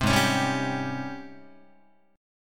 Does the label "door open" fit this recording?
No